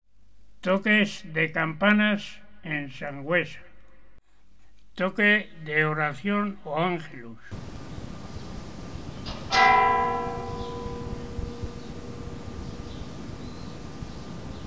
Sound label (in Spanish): Toque de Oracion y Angelus, Campanas